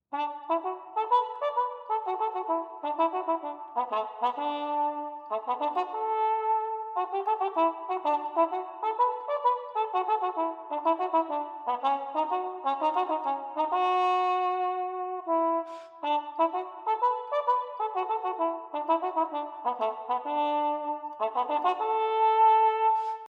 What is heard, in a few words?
Soft Shoe